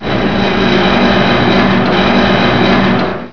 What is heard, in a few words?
Drill